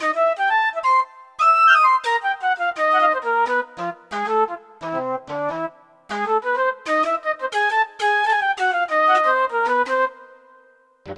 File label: Ffflute, Roland D-70; Factory Performances